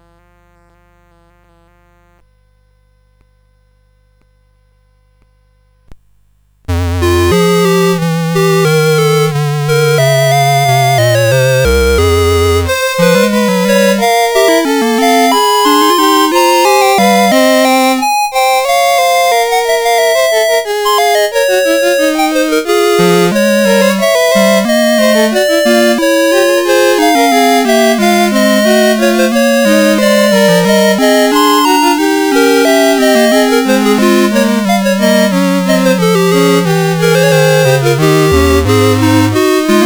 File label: Invention in F Minor, CSG8580R5